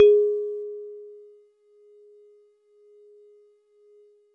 Warble Celeste DX100 G#-1

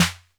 SD 808 Pitched

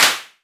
Clap; EFM

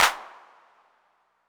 Clap 808 Color, Hand Clap

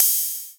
HAT

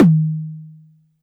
Tom High

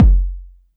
BD

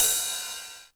Ride 909 Clean

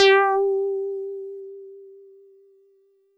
Mono Bass DX100 G5

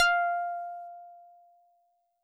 SolidBass DX100 F6

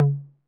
Tom Mid 808 Sat

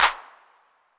Clap 808 Saturated A, Hand Clap Multi Saturated

